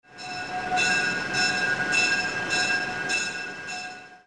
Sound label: train bell; prague; Soldier Of Fortune II Double Helix; XBOX; ambience